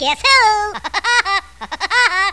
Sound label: laugh